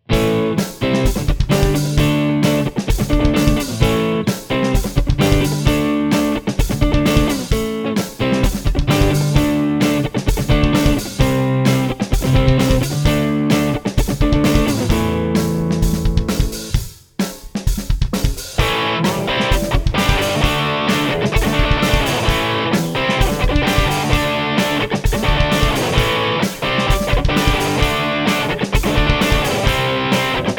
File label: hot drive; GNI Hot Drive